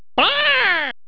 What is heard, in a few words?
drumstick